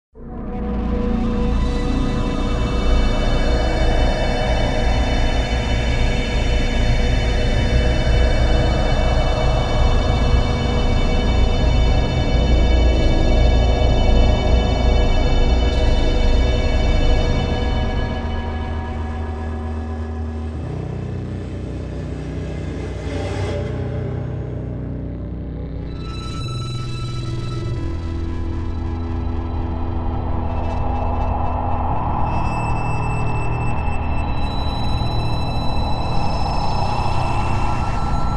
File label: Horror Sting